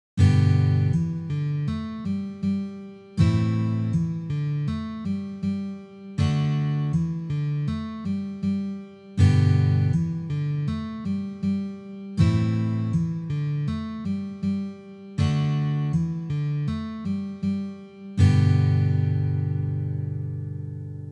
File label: Pull Off